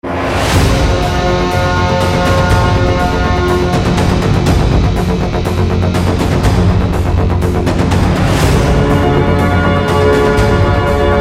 bomb planted, music; Counter-Strike Global Offensive

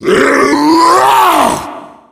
tank throw, yell; voice; Left 4 Dead 2

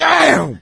choke; Left 4 Dead 2; coach

